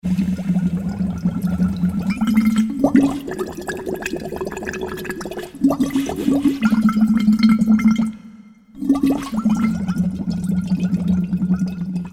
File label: gurgle org